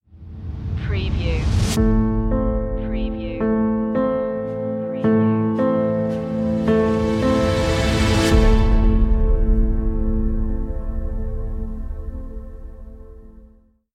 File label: heartbeat inspiring cinematic orchestral stinger